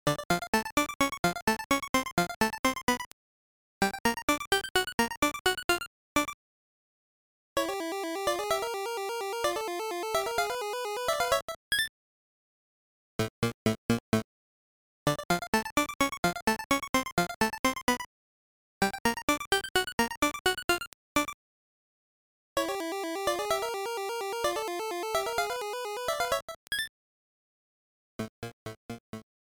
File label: Pumpkin Zone BGM; SML2